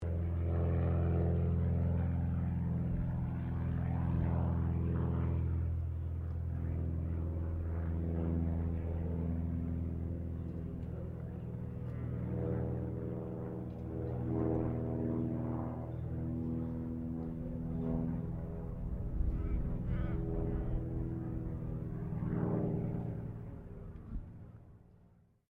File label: Aircraft CAC CA-25 Winjeel pass distant, WW2 era Australian training aircraft; Airplanes